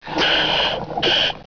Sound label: Panic; SoundDiver 2.0.10